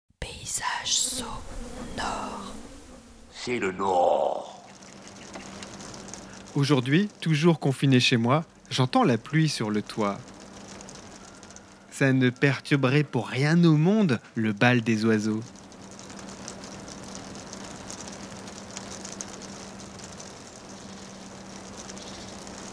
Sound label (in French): Jardin sonore Pluie d'oiseaux